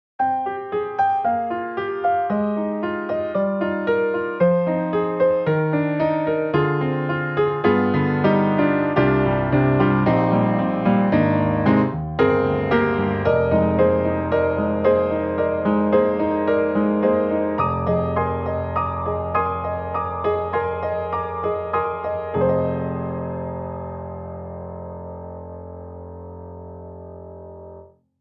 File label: Keys Modern Upright Retro Stereo; XLN Audio; Addictive Keys